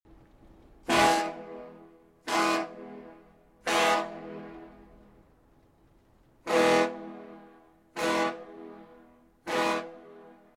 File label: Multiple Ferry Boat Ship Horn Blasts